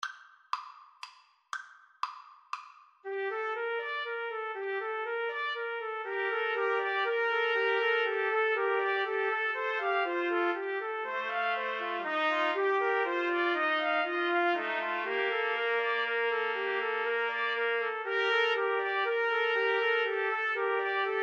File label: TPT trio, Trumpet Trio